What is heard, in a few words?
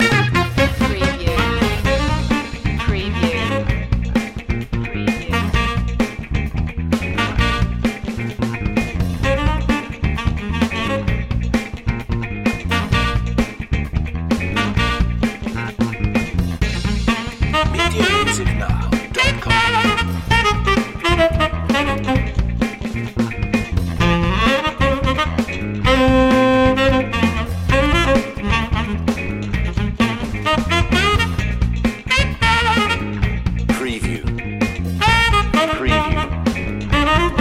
busy funkster